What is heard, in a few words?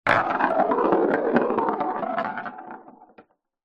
sport snooker ball roll across wooden floor